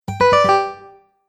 Game Bonus Descending Strum, Video Game Sound Effects